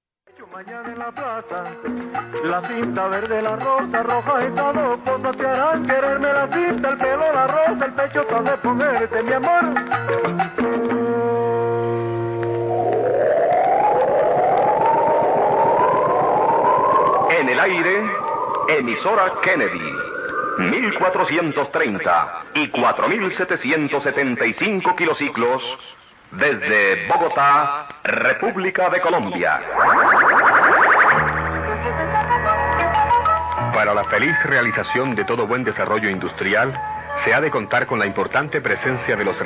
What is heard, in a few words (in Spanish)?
Emisora Kennedy Bogota 4775 kHz